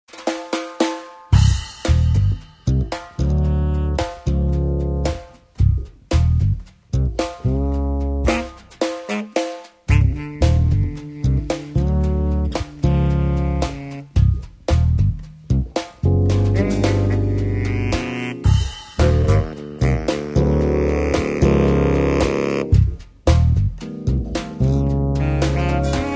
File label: sax